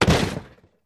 Objects Fall Various

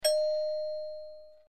Single Tone Door Bell

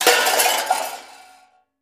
Junk Thrown Junk Pile, junk crashes; Junk Thrown Onto Junk Pile

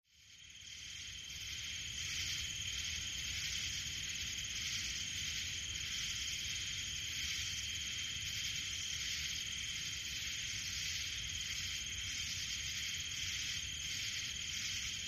Forest Amb, crickets

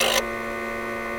Apple Ii Disk Drive

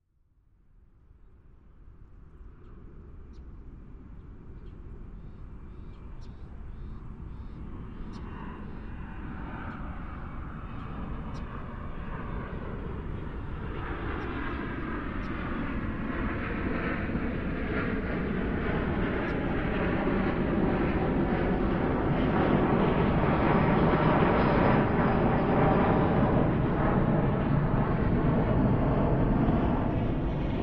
Heavy Jet By Rumble; ext airport

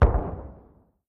105mm Howitzer Shell

